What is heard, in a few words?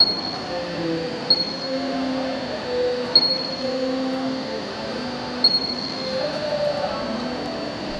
luxury mall ambiance soft, retail